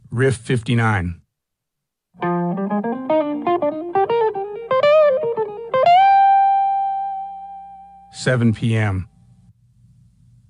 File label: Riff